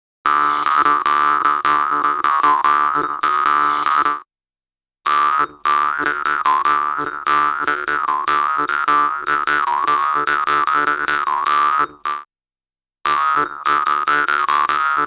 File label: Didgeridoo And Overtone; Good Stuff Audio